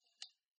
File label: katana in and out